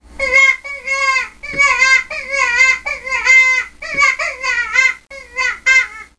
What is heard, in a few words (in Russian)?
krik krolika kotoromu nuzhna pomosh